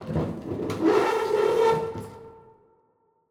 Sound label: Metal Squeal; METLFric; Stereo; Justsoundeffects; Metal Movements